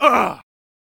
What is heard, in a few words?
Knight Death Vocals